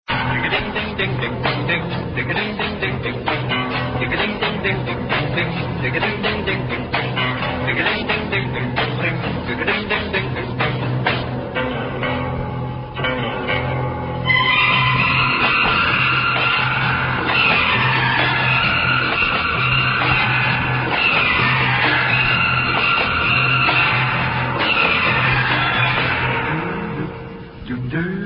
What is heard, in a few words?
violins